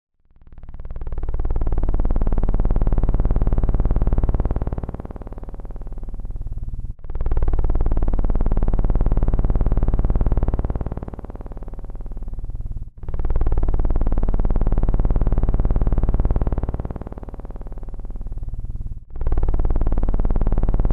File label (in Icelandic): titrandi hljod